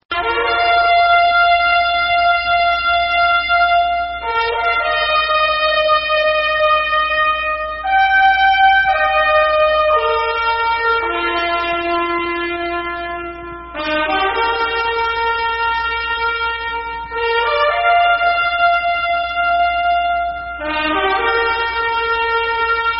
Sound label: Fanfare